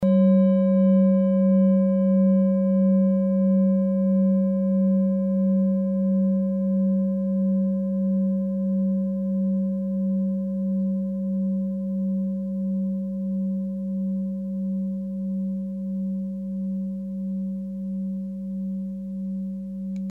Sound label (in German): klangschale orissa